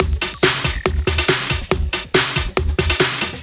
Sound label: Break Beats, 140 BPM